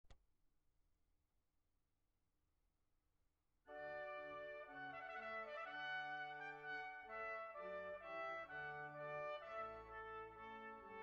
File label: Trumpet and organ voluntary